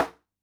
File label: TABLA, PERC